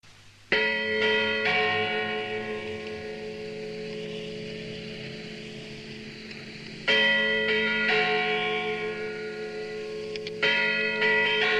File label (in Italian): campane di san michele; campane s michele 1980